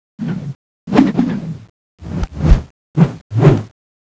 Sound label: dragonsword idle; cstrike; bb weapons